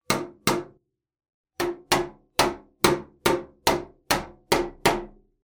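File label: plastic sound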